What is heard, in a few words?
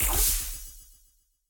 Miniboss Spikes